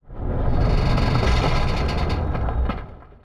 move; volcano harvesting

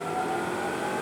accelerate, vehicle

